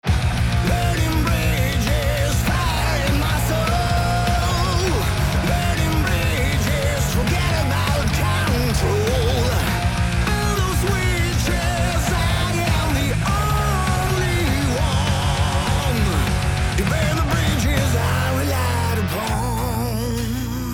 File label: Sample Metal Mixed